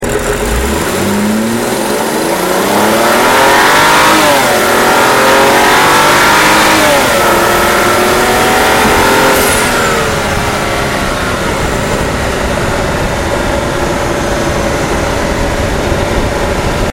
X6 Acceleration Outside

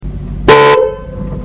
Persona Horn Outside

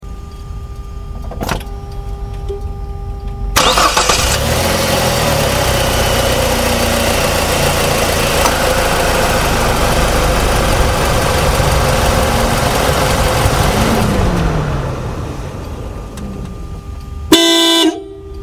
Escape Ignition Horn Outside

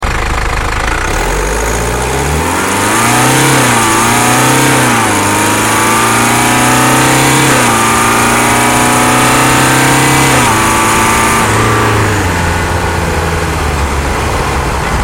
320d Acceleration Eco Pro

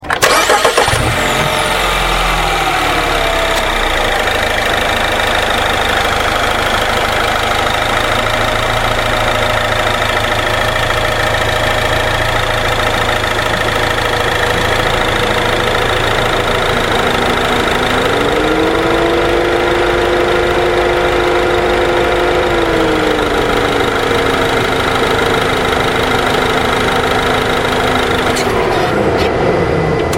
Mini Contryman Ignition Fan Outside

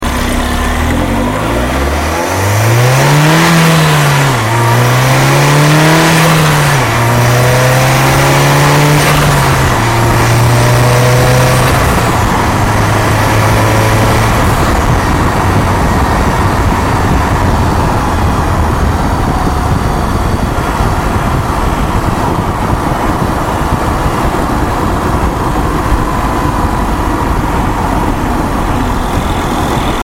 Cooper JW Acceleration Outside

